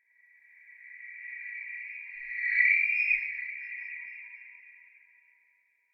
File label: Bird Chirp Warble - Reversed With Reverb; Hollywood Edge; sound designer's toolkit v2